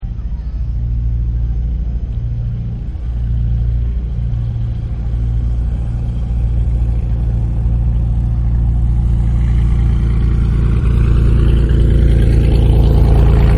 Old plane flyby, An Old Plane Fly By In The Sky With Engine Rumbling; Airplane Sound Effects; Vehicles